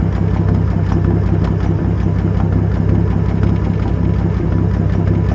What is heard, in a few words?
greyhound idle; WAR